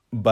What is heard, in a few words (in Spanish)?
Barrer (Sweep)